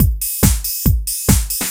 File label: Beat 140; Audacity; loops